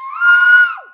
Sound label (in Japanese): kya-; easyrpg; Ib